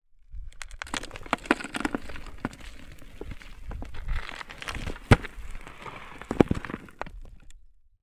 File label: Sand Pebbles; TOOLKIT vol.2